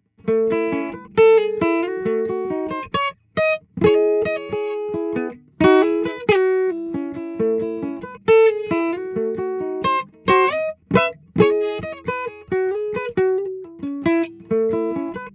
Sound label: Classical Guitar, AKG CS1000